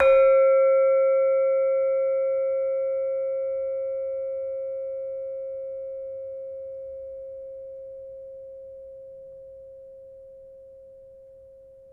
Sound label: Demung Sl 1, gamelan; gravityharps; timbrebox